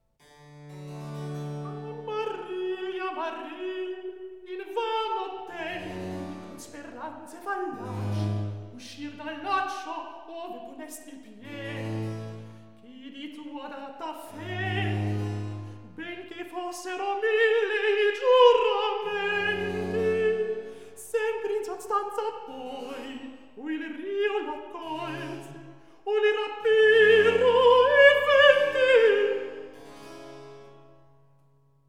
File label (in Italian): Recitativo; Il duello amoroso HWV 82; Daliso; Amarilli, Amarilli; Handel Masterworks; Klassika